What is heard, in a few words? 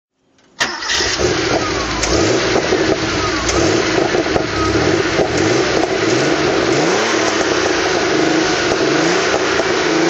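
porsche 911 turbo throttle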